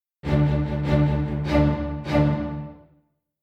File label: Combat Out